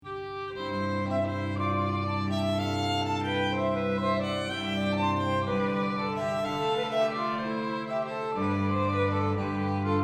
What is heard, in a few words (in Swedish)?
brudmarsch fran ostbjorka